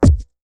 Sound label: Buttons Mechanical